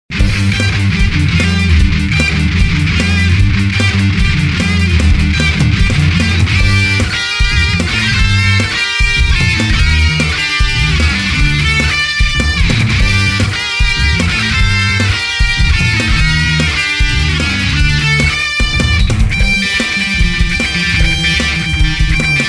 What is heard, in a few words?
Castlevania Clocktower, 8bit